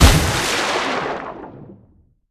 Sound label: torpedo; World Of Warships